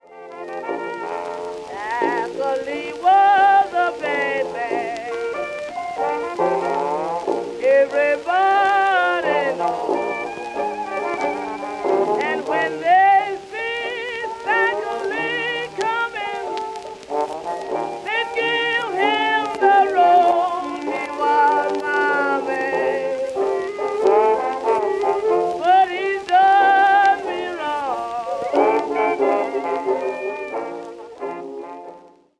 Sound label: Stack Olee blues